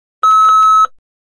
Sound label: Beeps Computer Mech